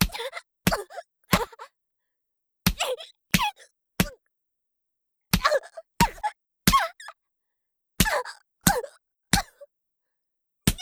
ストレート BPM90 ワンツースリー; 効果音あり

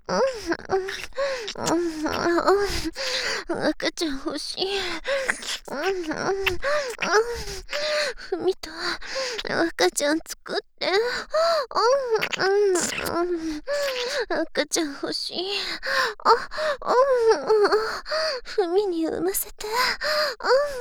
耳舐め喘ぎ; 赤ちゃん作ろ♪; ハーレムオナサポ 4人のメイドがご主人様のオナニーをお手伝いするバイノーラル～文、千曜、名月、莉桜～

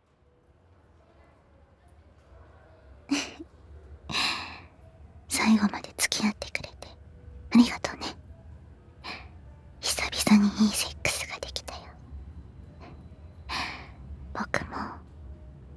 キス・耳舐め手コキ・寸止め・対面座位・中出し, ASMR; 再び電車内でエッチ♪

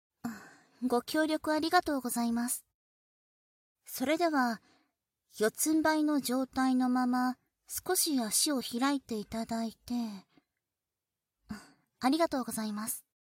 フェラ 尻マッサージ バイノーラルマイク 効果音なし, ASMR

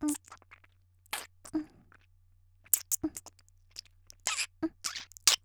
「フェラ」控えめ, 綾波系少女

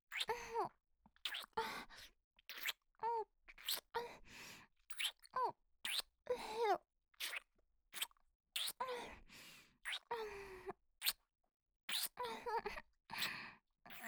普通キス 激しめ

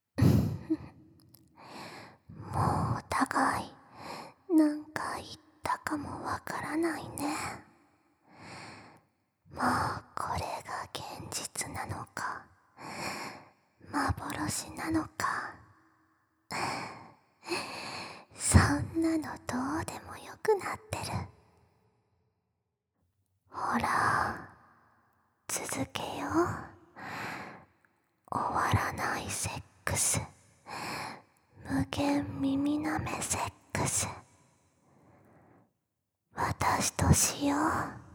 無限耳舐めセックス, ASMR; 反転

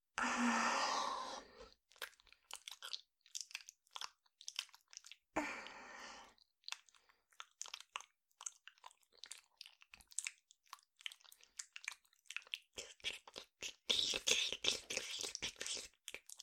乳首舐め（左）, 舐め音のみ

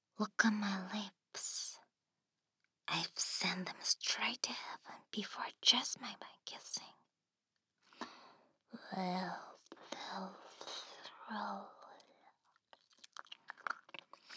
ブレスフェチフレーズ

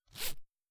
ジャッッ, ASMR

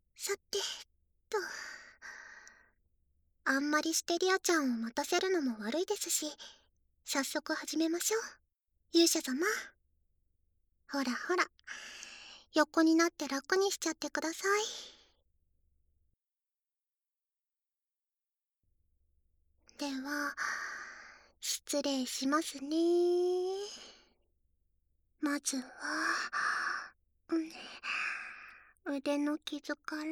全身 リップ ・ ディープ キス ・ 耳舐め 効果音 なし; 好き好き全身リップとディープキスと耳舐めヒーリングタイム♪

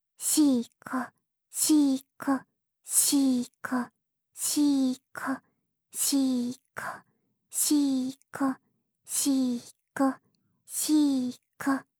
シコシコ オンリー ふつう; オナナビ4 ～ゆかりのオナニーナビゲーション～

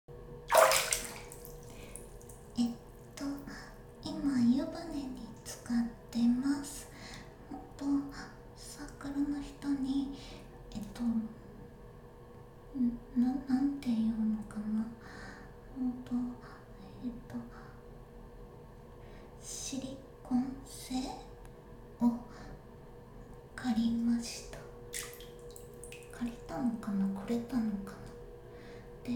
環境音あり、マスタリング無し

